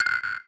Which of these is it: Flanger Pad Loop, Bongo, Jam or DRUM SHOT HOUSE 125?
DRUM SHOT HOUSE 125